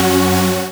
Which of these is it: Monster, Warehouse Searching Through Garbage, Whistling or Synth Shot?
Synth Shot